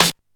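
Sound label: Snare Hirise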